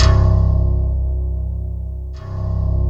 C1, SynthLine; 90 s Sample CDs; NiceBeats; SynthLine VOL-33

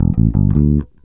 REAL BASS, BASSLICKS; Ueberschall; Jam Box

